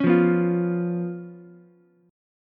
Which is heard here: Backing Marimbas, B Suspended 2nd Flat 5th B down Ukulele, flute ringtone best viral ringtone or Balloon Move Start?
B Suspended 2nd Flat 5th B down Ukulele